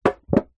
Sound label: foley tin push finger flex; zapsplat